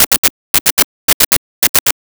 Warning Beep, Science Fiction

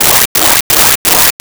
Arcade Alarm; Arcade and Games

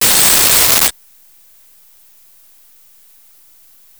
Ring (caller in); russia; Rosegarden

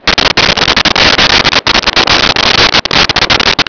flapping flags loop

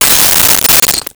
Window Break; Crashes

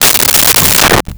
Dresser Drawer Closed; Doors and Drawers

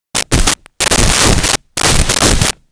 Running on Concrete with Tennis Shoes; People

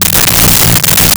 Strong Magnetic Field Loop, Science Fiction

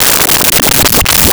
Game Over; 1001 Sound Effects

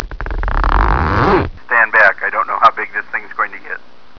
zipper

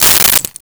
Keys dropping on the Table, Foley

